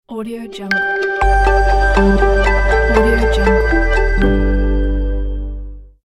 positive trend ident

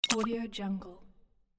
bubble rattle app close

